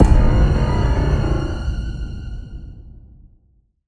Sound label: Mystery Ident; soundscrate